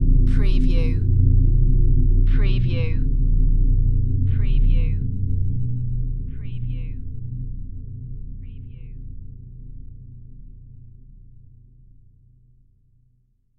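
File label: Production Element Hit Dark; Sound Effects Bible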